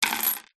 household jewelry necklace silver charms set down wood floor; zapsplat